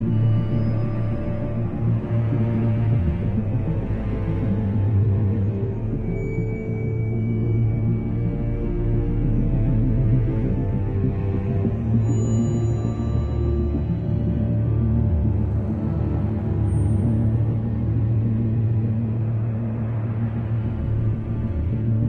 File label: Loop Tense; the walking dead season one